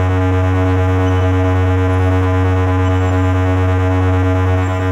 Music Bomb Cart Drone; Battlefield 3

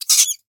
rat squeek

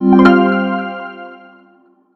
item get; Happy Birthdays

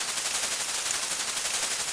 Grass

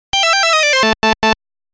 failed; Armadillo; NES

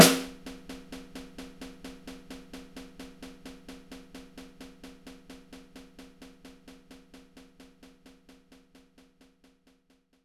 Standard Snare; knights try

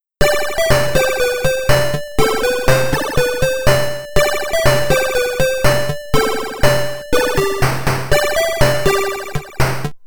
London Bridge is Falling Down, Tandy; Lemmings

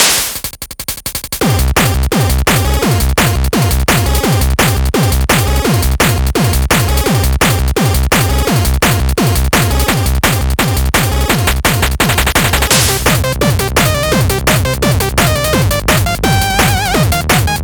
Time Attack 8-bit; asterix obelix xxl romastered

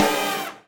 Combo Hit

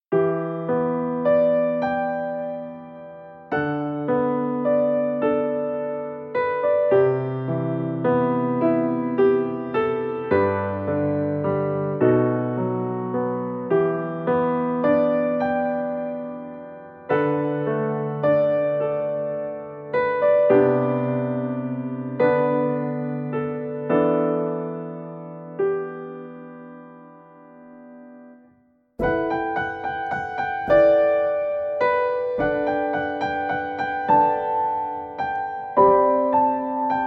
Painful Pianissimo; Cupid Parasite Sweet & Spicy Darling

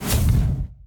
puff smoke

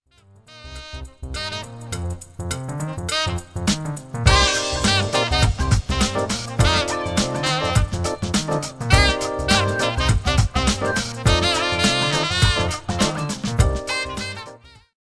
Blues; Johnny Bazookatone; saturn

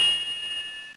Laser Focus

